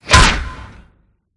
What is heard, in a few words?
Sfx Baseball